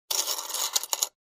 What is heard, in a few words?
Coins tinkling in cash register